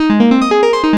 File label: Korg, FX